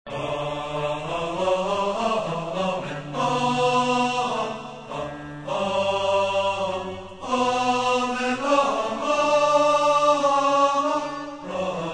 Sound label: Choir And Orchestra